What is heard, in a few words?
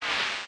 robot die vox; fight